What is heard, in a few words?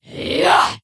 Attack; Tenebron